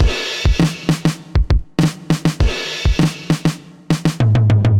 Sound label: Trax Variation, Drum Machines; Deeptrax; Ableton